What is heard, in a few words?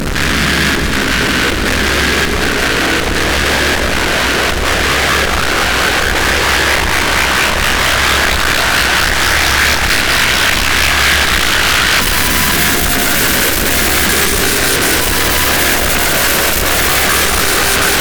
heart pulse quickening; heartbrain